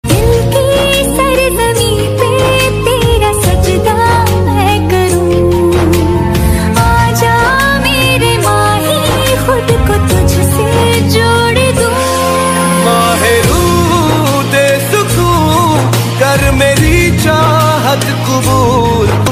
new female version love sad song whatsapp status hindi ringtone new female status